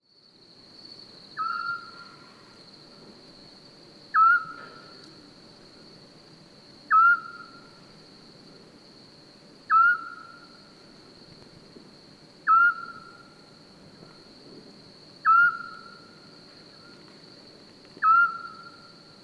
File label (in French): Hibou petit duc; Francheville